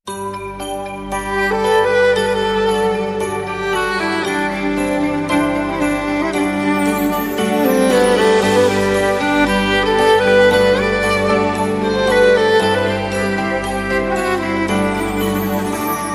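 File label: Christmas instrumental music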